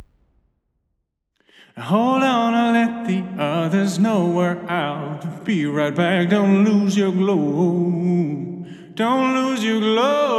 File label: vox vintage chain hall, Vintage Vocal-Sound; DAW